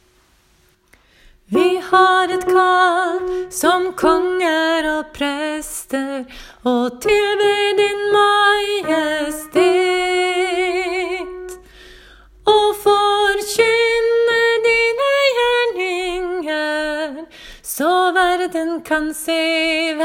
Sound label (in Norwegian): la vår lovsang stige vers; åfjord